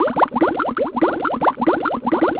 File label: cauldron bubbles; graal2001